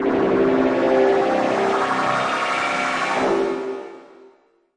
thick noise and tone

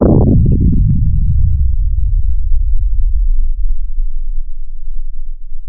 lavaburst; Scars FNC SFX

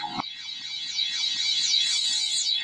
mirror universe loop; Computery or Science Fiction

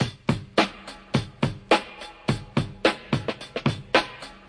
107 zoo break, drum loops; 101 to 110 bpm

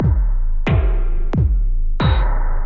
109 industrial ping pong, drum loops and breaks; 101 to 110 bpm

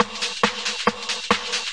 138 bpm, rim house, drum loops and breaks

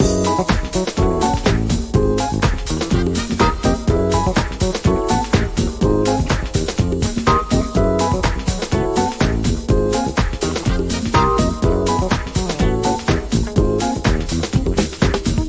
ultra jazzy ripoff, 124; SAMPLED MUSIC LOOPS

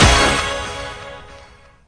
HUGE CHEEZY bright stab; Punches Hits Discoblasts; single hits

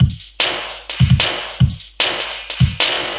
150 gated snare rolling hat, drum loops

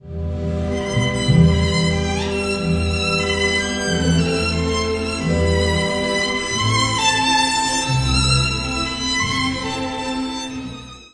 pretty violin solo with orchestra; ORCHESTRAL and CLASSICAL

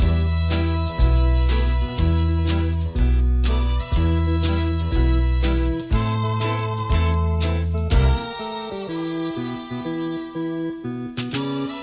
trumpety organy swing; SAMPLED MUSIC LOOPS

